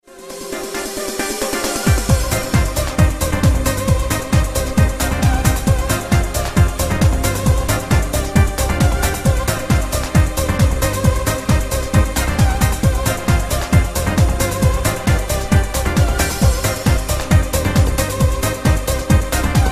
Classic Trance

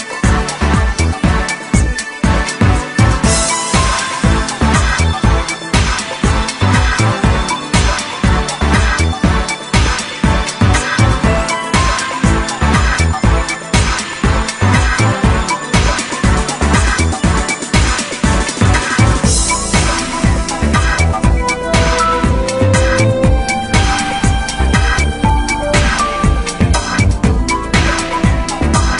Electronic; MGS2

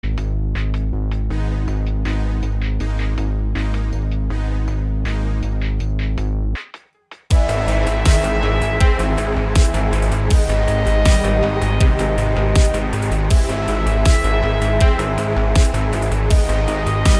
Darksynth

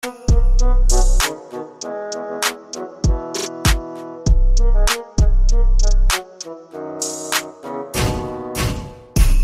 Type Beat

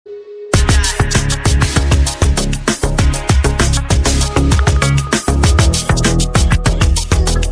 New Sms

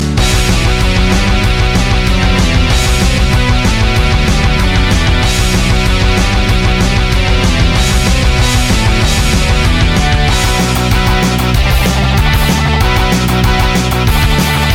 Rock Sound

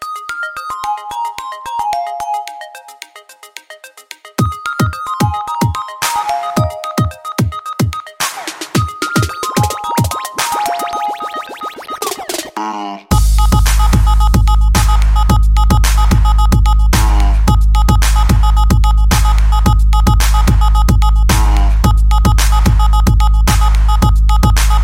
Christmas Edm

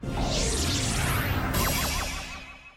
Jingle Theme; Mha

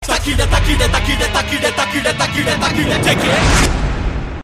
Rap Humming

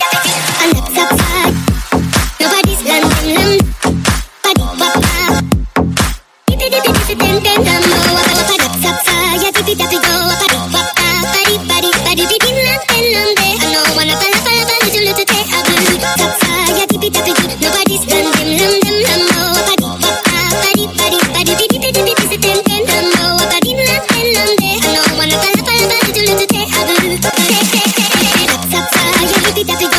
song polka

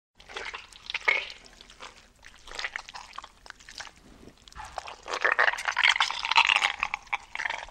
Gooey Slime Short

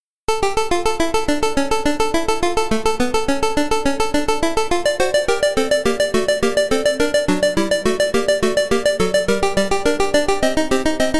8BIT; BWV 565 FUGA

